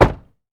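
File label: DOOR CLOSE, Car; Transportation; VSI Car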